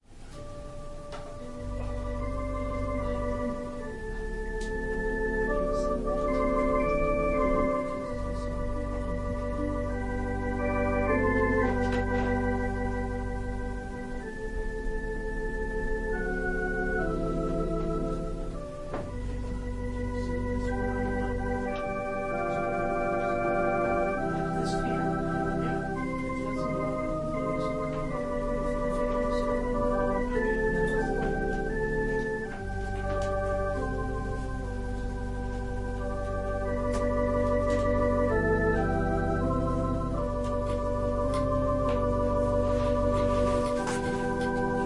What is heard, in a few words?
Organ and violin prelude